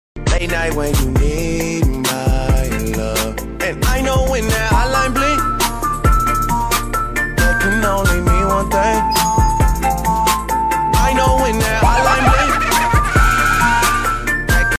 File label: beat